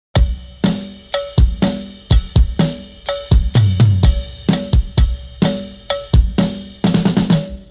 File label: intro, drums